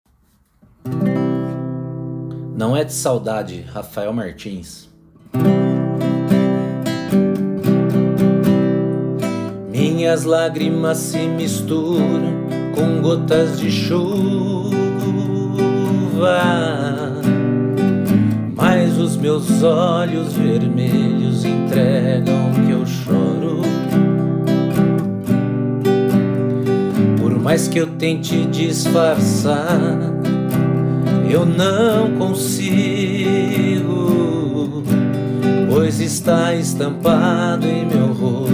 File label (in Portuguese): voz e violao